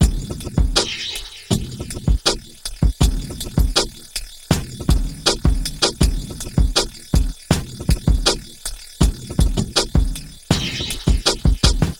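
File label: Loop, beats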